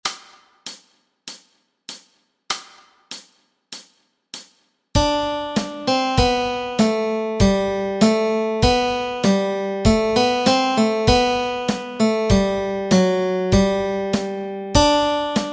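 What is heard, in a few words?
melody, Easy Guitar and Ukulele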